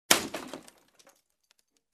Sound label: Wood Branch hitting ground